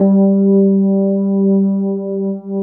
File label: Fender G2; USB Soundscan vol.09; AKAI; Keyboards Old School